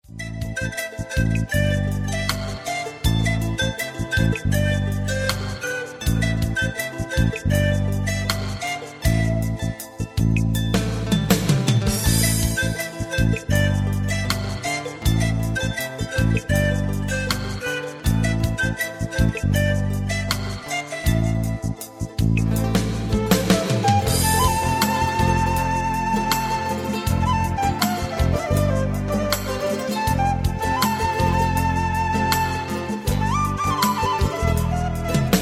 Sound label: PANFLUTE